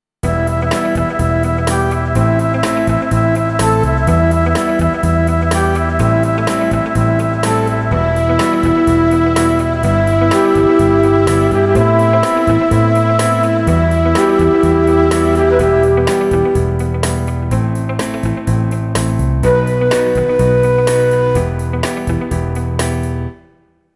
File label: Rock Rhythm in Em Pop Ballad 125